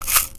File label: indoor